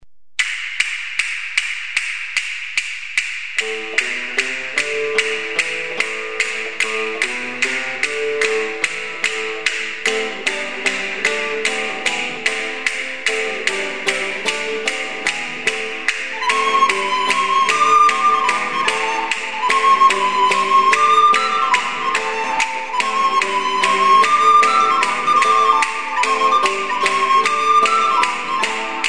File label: tarantella